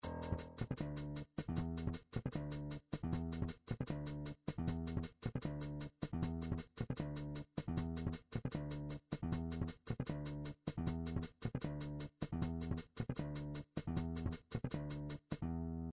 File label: slap, midi